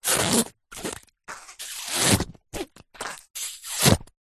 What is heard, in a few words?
cartoon wet rubbery movements; zapsplat